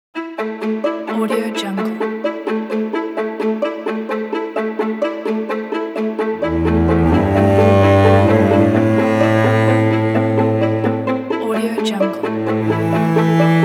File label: dramatic intro